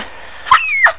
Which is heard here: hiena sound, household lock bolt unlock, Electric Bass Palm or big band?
hiena sound